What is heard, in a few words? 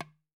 ROSEWOOD, African Drums